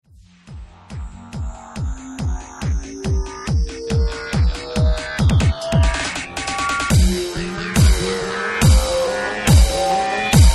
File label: DUBSTEP